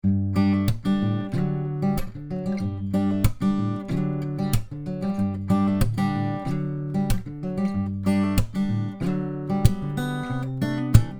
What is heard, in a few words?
ibanez aam700ce percussive miced